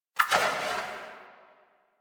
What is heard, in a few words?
Omicrus Tail; Warframe; Quests; The New War; Weapons